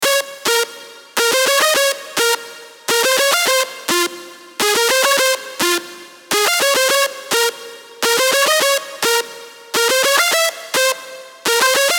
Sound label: Fm 140, Hardstyle & Hardcore MIDI Melody; Villian; Unison Hardstyle & Hardcore MIDI Melody Collection